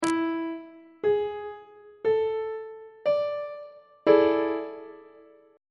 g double sharp major 7th suspended 4th 2nd inversion on treble clef